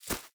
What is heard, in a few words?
Step Run Grass; SandBox Musics